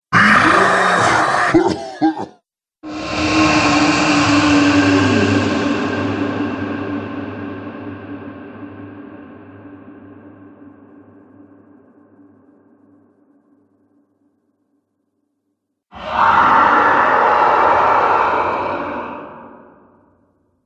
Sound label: Three Dragons, monster; horror